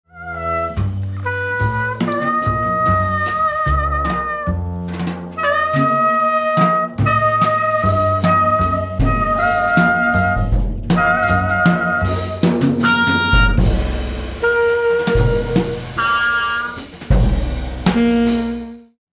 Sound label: Fugue, Quartet; Gould Bouldern Fugue III